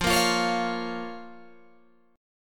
F Suspended 4th Sharp 5th F, Guitar Open G

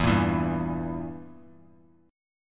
E Diminished down; Edim strummed; JGuitar

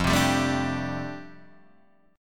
F 6th Add 9th F down Guitar Open D, chord

